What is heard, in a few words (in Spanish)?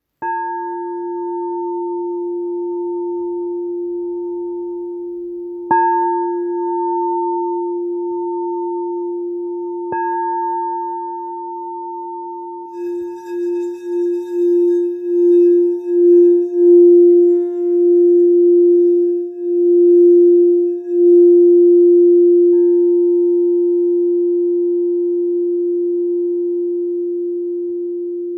Verde fa 349 hz, cuencos tibetanos